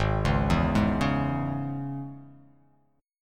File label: Ab Suspended 4th Sharp 5th down, Absus4#5 chord; arpeggiated; JGuitar